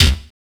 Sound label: base drum; DRUMS